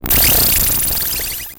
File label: Transition Electrical Distortion; Adobe; Production Element